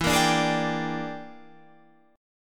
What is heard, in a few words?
E 7th Sharp 9th Flat 5th, chord